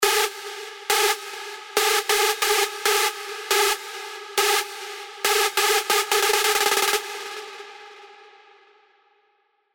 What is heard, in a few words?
LD, Sylenth1; get some; alonso 19 year anniversary pack